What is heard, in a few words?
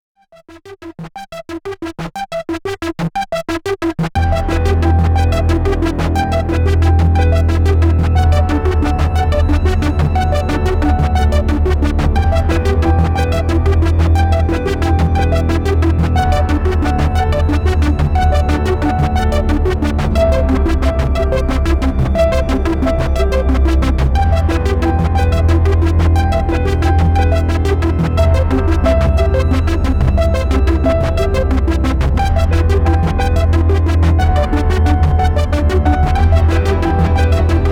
Arturia Minibrute